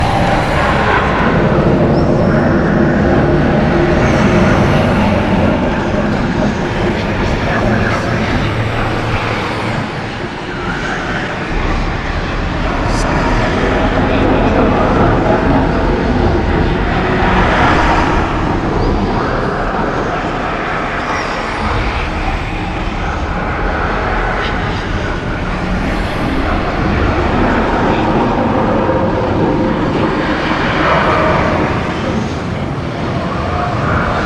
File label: magic tree moans loop